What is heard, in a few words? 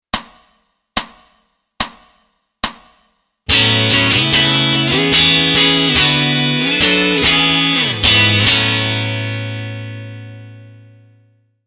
fig 4 (A Major)